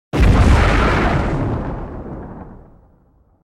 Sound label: Explosion - Deep space, with distortion; Hollywood Edge Sound Designers Tool Kit